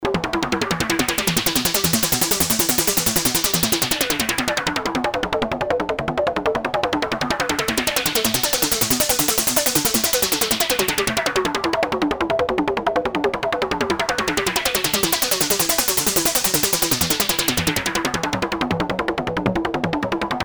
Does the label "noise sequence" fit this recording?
Yes